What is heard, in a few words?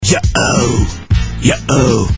Yaaaa oooo